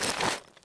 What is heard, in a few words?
l9 grab, weapons